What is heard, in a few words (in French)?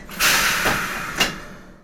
Freinage urgence; OpenBVE; Train; Orange